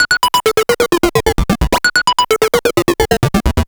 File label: Sh101 Loop, 130BPM